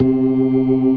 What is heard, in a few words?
CLASSIC C3, Synth and Organ